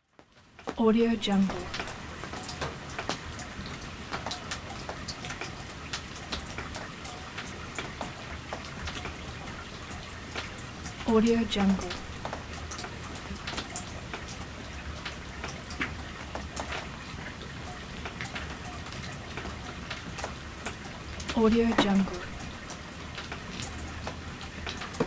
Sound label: rain drops